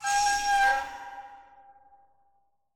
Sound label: Curtain Open; Monastry; Silent Hill Downpour